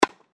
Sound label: bounce